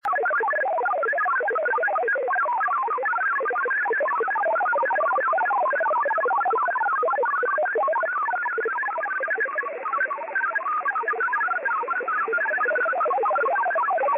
CROWD36 Data, numbers stations

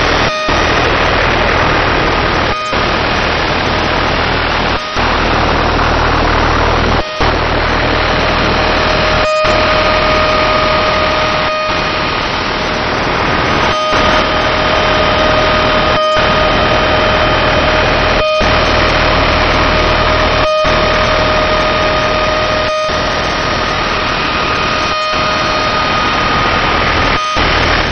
Oddity Signal 5354.0kHz

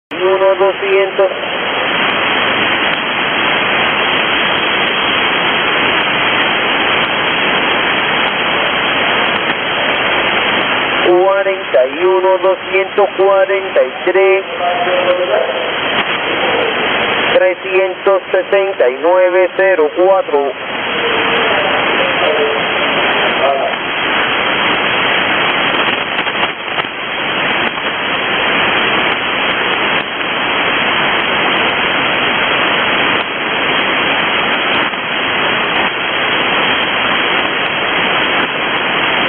KHZ USB Cuban Babbler, numbers stations

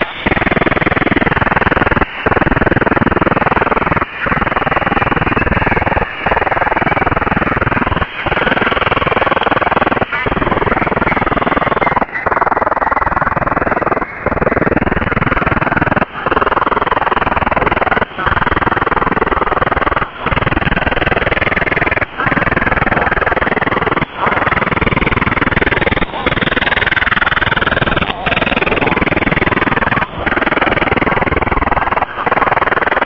Radar 5140kHz

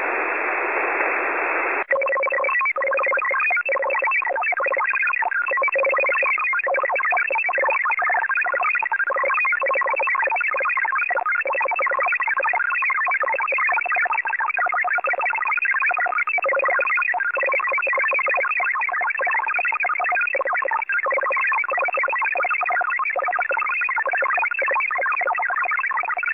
11547.0kHz

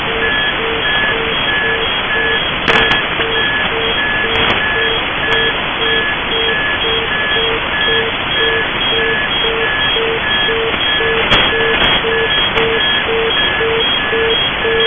Three Tone Beeping, numbers stations; Russia; Ukraine

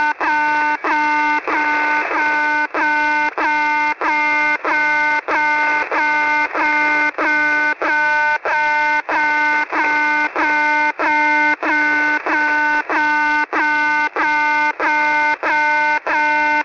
The Alarm, 4770.00 usb, numbers stations

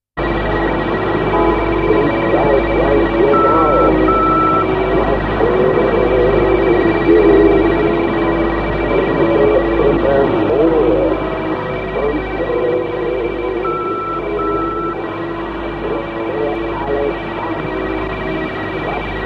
Music and Morse; G21; Conet Project

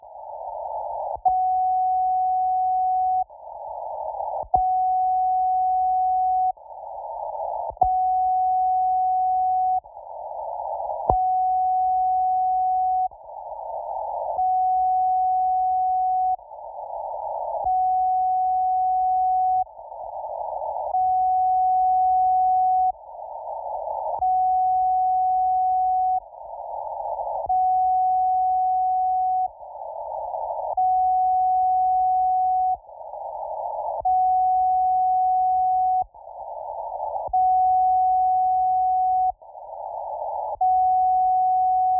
15320.0kHz

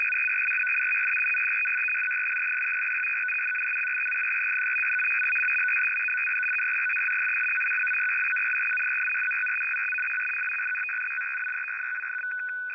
15764 kHz USB

